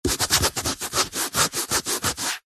sport rugby ball scrape scratch surface with finger as if removing mark; zapsplat